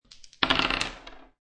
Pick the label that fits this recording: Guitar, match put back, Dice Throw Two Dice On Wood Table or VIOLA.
Dice Throw Two Dice On Wood Table